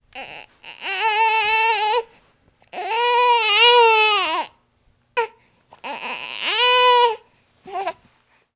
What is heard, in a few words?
Baby cry